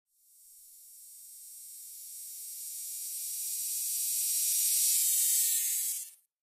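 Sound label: Electric Buzz Rising; buzz & hums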